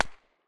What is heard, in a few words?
silencer mp9 mono far, weapons